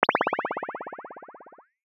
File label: spring